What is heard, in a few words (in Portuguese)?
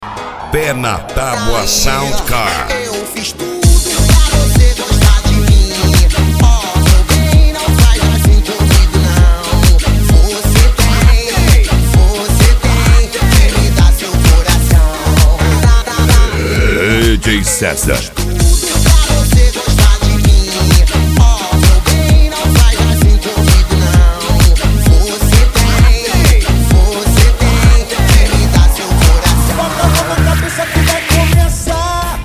sound car carnaval